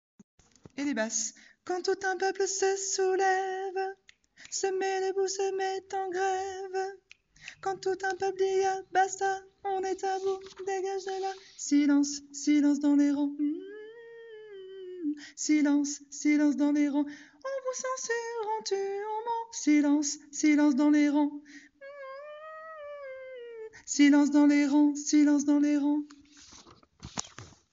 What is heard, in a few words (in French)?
Silence dans les Rangs basse